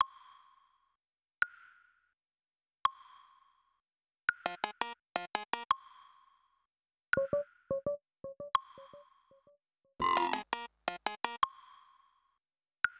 Melody

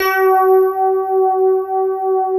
D PF C 3

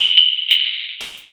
Gothic Bumpers, Fx; Sonic Foundry (Sony Creative Software); Esoterik Beatz

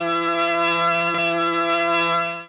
pipe organ, Orchestron, a0; Retro Sampler

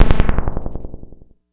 Cwejman S1 - Make Noise

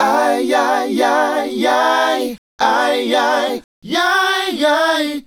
Male Group, Vocal

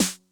Snare Accent Mid Tuning Mid Tone Max Snap, 909; classic machines

